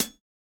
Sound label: ClHat, drum